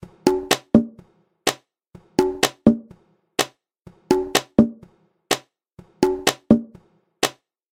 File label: percussion